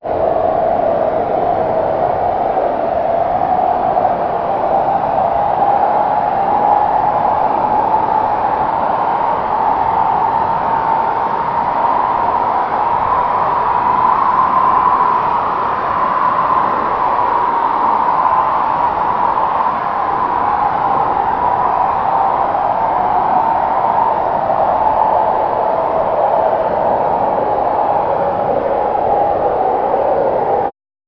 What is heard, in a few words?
wind long